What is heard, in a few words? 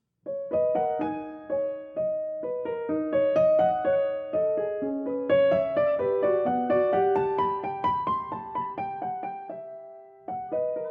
Improvisation